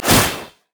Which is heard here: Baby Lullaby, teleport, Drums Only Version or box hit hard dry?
teleport